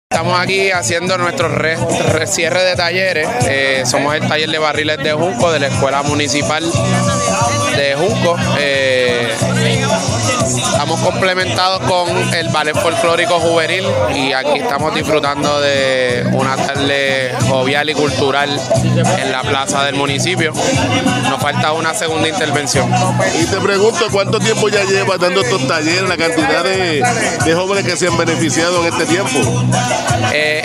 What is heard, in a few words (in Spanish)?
bomba; talleres culturales en la plaza de recreo de juncos